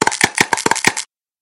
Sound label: monster skeleton